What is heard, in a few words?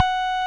Roxichord, E.Piano; Roland LCDP10 Keys of the 60s and 70s 2; RMI